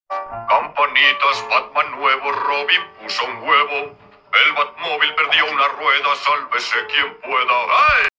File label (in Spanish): campanitas; batman nuevo